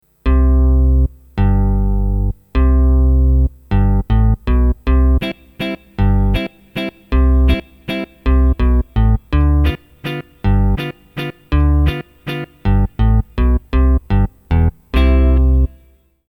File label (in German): Ebass Italo